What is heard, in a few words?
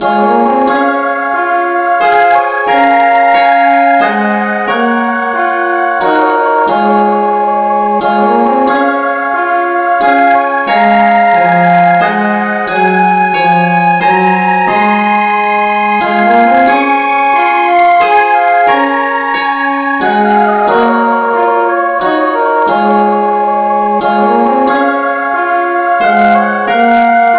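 no vocal key c sharp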